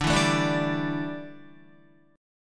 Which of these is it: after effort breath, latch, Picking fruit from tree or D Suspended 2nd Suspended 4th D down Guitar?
D Suspended 2nd Suspended 4th D down Guitar